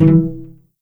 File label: E2 PIZ CEL, string; East West; The Ultimate String Collection